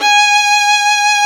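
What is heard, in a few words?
Vio legato G#4; Digital Sound Factory Virtuoso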